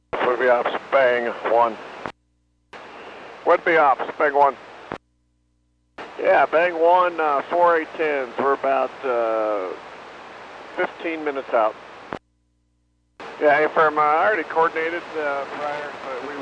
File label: bang